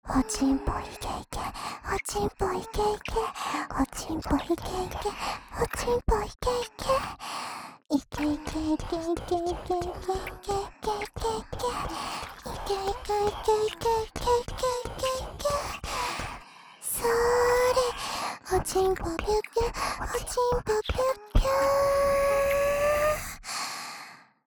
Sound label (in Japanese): 射精しちゃえ♪ ＋ Wキス; おまけループ（オナサポ）; 『パパのざぁこ♪ざぁこ♪』